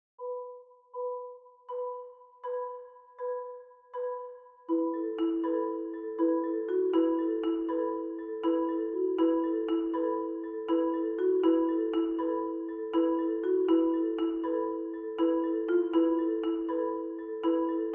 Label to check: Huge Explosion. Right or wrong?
Wrong